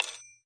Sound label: filled